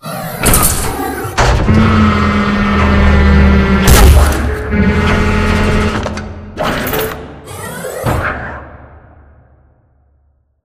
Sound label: Assembly Wall Arm Close; Titanfall 2; Boomtown